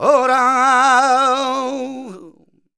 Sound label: voc c, Flamenco Vocals Male Cantaor